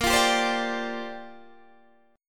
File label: Bb 6th Add 9th Bb Guitar Open D